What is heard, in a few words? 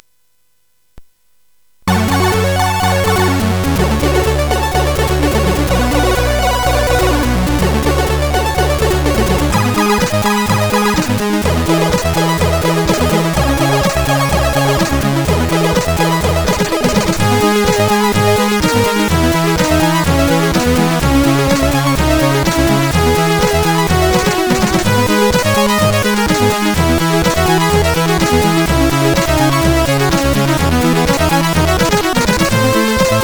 Intro, CSG8580R5; X-ample; hvsc